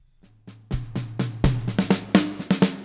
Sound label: BLUE F.E -L, Backbeat, BLUES SHF